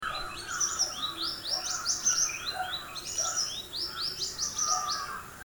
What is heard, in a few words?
Orange Minivet